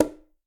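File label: Bongo Low Slap; Latin Percussion; Drums; Drum Hits; Ableton; Factory Packs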